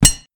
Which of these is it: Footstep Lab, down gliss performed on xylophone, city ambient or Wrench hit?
Wrench hit